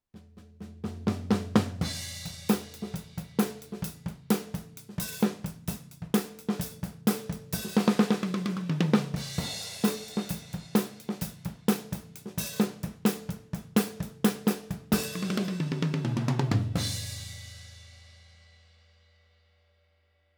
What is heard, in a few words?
drums, C460B